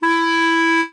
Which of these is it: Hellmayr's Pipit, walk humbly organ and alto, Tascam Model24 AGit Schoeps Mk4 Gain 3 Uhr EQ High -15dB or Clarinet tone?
Clarinet tone